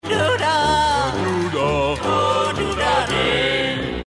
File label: Doo Dah